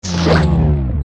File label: Hit; ReDead